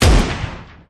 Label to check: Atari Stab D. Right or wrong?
Wrong